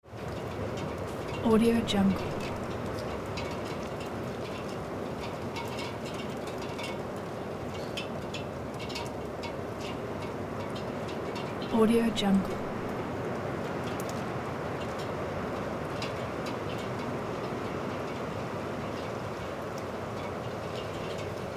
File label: light rain and windy at balcony